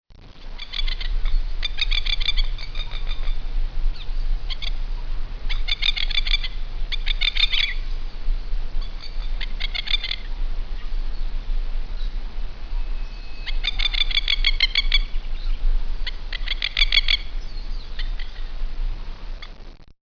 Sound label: masked lapwing